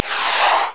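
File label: Missile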